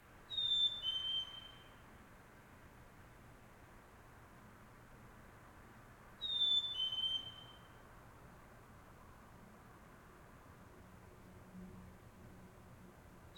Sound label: AMB SUBURB Solo Bird Call, Early Morning, Distant Traffic Passbys, Montreal, Canada, LOOP; Articulated Sounds; Nature in the City